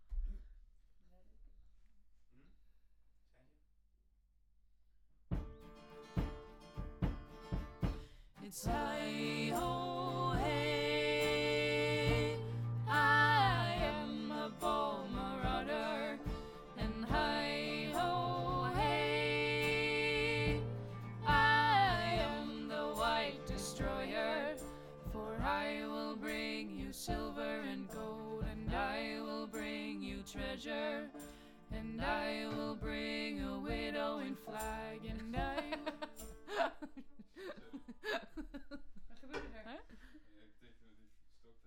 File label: Voc Fig8